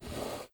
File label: window open